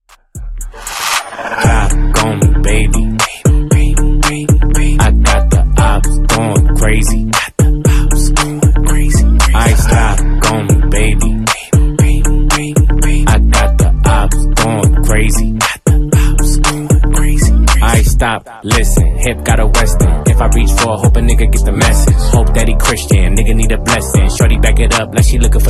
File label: intro outro dirty 116 Bpm